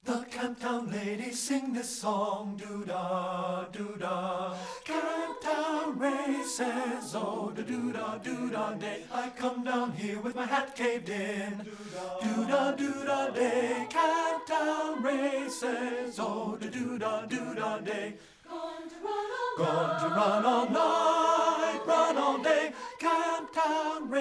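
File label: madrigals, tenor; STEPHEN FOSTER MADRIGALS 2; partbypart; satb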